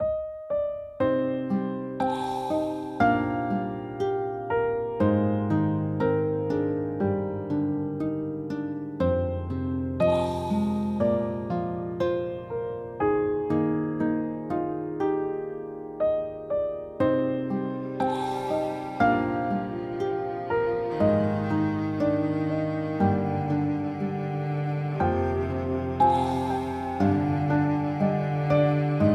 Romantic Calm - Ship Dock; legrand legacy tale of the fatebounds

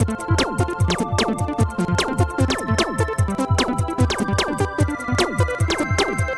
Electro

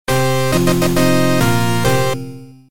No Victory; Hiragana Pixel Party

